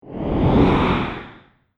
Big Dragon Wing Flap; Monster Sound Effects; horror

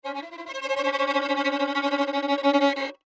Violin Tension, Violin Tension Build Warble; Cartoon Music; Blastwave

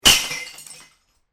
Bottle Smash Heavy; Smashes; Objects

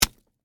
Impact Celery, Texture, Impact, Celery, Vegetable; Miscellaneous Impact; BlastWave

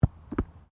SOCCER BALL BOUNCE CATCH GRASS; Soccer (Sound Effects:Sports)

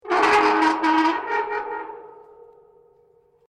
METAL CREAK LARGE; Materials

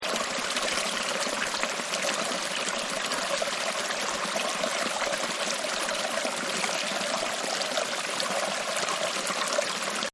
Stream Gurgle; Rivers And Streams; Partners In Rhyme

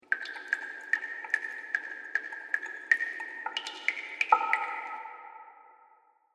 Water Fantasy Cave Drips; Dripping Sound Effects; Agcnf Media

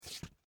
Footsteps, Boots, Scrape, Concrete; Footsteps (Sound Effects:Human)

